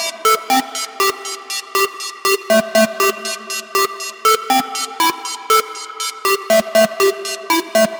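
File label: synth, Electronic Loops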